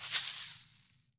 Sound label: caxixi; AKAI S-Series CD-ROM Sound Library VOL-5